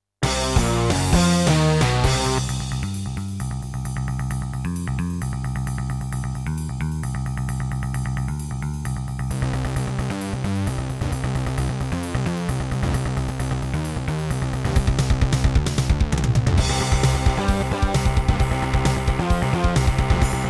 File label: Roland SC-88; sigil doom episode 5